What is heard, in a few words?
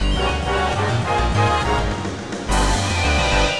Boss Battle Intro Variation